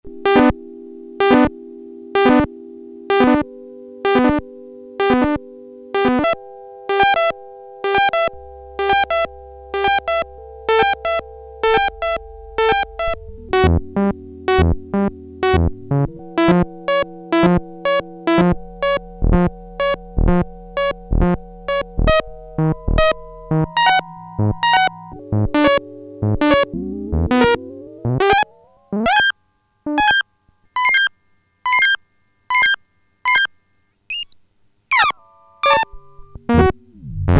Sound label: Random Arpeggiator, Prophet-5; Sequential Circuits Prophet-5 (Ver.3); Factory Patch